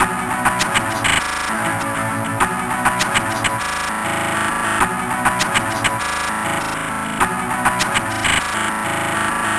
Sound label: 8 Bit Beat, Modular Electronica; Beats; Sonic Foundry (Sony Creative Software)